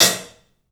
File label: HAT, CLUBHAT, 3D Drums; 3D Sound